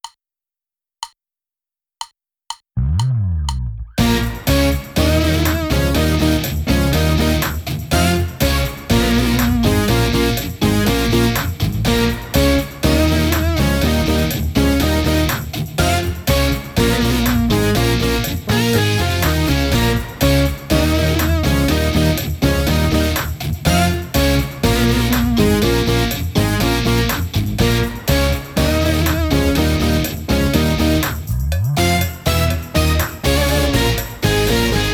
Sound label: Motown Rock drumless